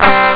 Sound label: short guitar; Amiga Soundtracker sample